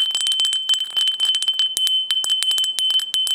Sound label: bell